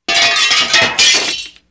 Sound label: household